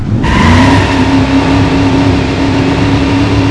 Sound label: rev, vehicles; c5500